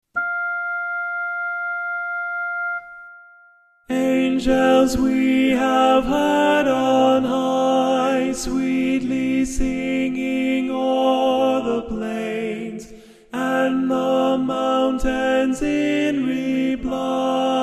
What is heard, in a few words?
angels tenor